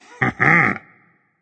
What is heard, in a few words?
heh heh berserk; cuchulainn